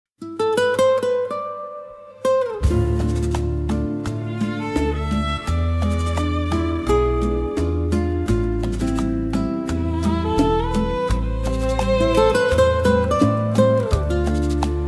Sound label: Café Cubano Acoustic Bolero, Bolero – Jazz